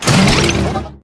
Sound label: Battle Machine Deploy